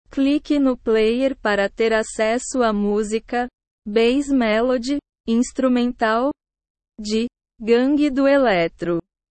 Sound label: bass melody instrumental